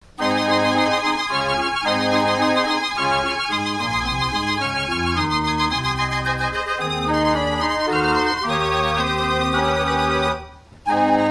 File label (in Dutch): draaiorgel; de fata morgana